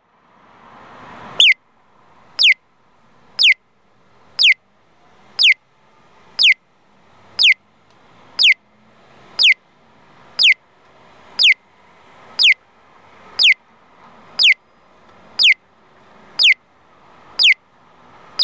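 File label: accessible pedestrian signal, piyo; onkyo kosaten; aichi; inazawa; konomiyaekimae; nagoya U-TP-1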